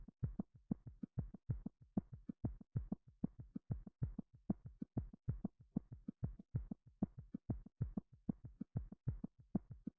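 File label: with drums, instantaneous mixture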